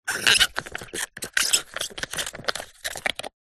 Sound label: hot water bottle rubber plastic bung undo remove; household